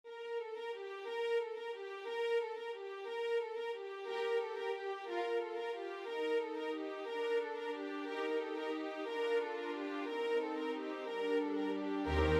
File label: string ensemble; carol of the bells